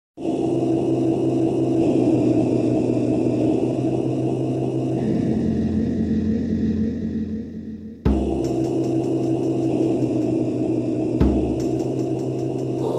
Haunted House

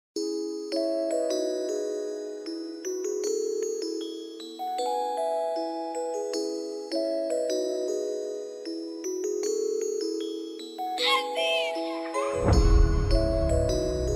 Beats

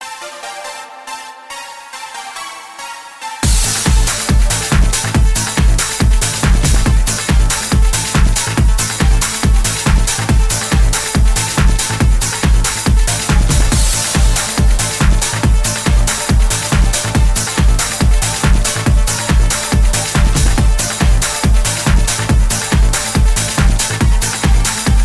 Bells Power-up

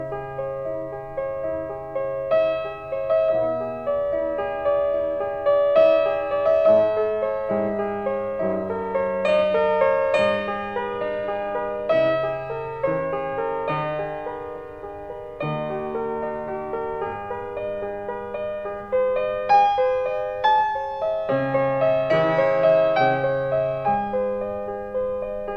Piano Sonata

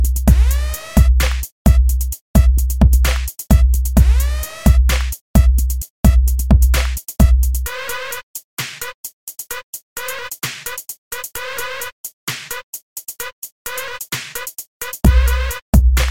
Bass siren Remix

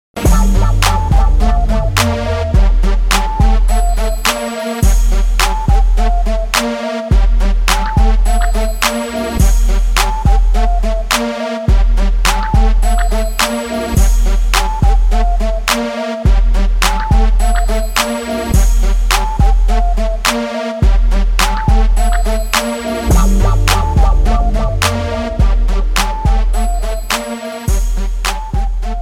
Bass Efect

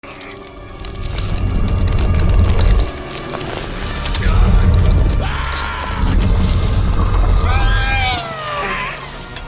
Roar; Atlantis

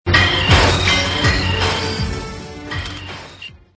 Phone Ringtone

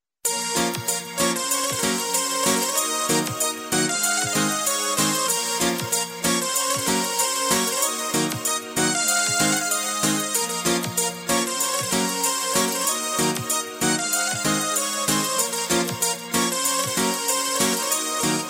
Orga

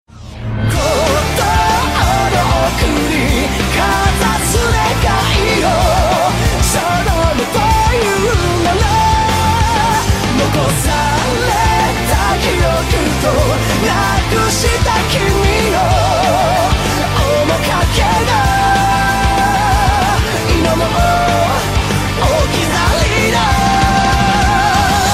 Chorus

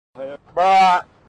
Brap

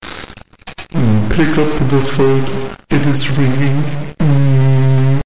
Pick up phone

